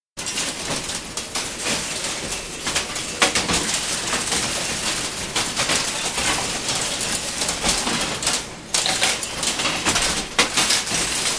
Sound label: Linotype Machine, operator's perspective; 1930 onward; Machinery; BBC